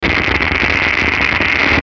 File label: Static Dark Distortion Crackle, Hit Static Bass Descend; Production Element Imaging Element Accent Transition; Blastwave